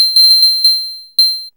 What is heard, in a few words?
guiro, synth guiros; Zero-G Ambient 1